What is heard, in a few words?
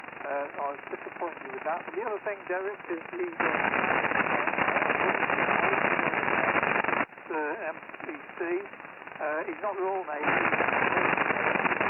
80m rain static